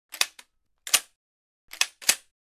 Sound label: Benelli M4 pump series